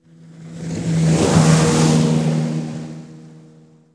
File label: 10 HORSE PASS, navi; trasporti